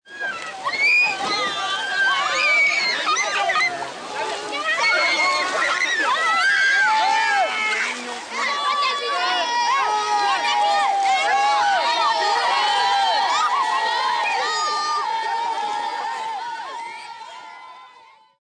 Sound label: Scream Ambience, Scream, Group, playing & screaming in a Bolivian lake, rowdy children, kids; males, females, girls, boys, human voice; VoiceBox